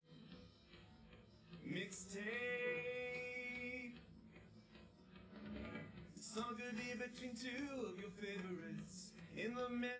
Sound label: wdrums liverec 250ms 5cm sim